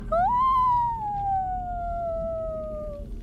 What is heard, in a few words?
woooooh